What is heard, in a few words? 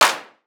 Clap, Drums; One Shots; Mannschaft; Ableton Live 12 Lite; Core Library